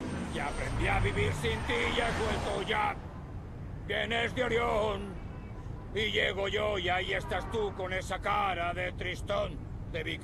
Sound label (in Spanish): cantando; Pokemon Circon BETA 2 v1.8; Pokemon Essentials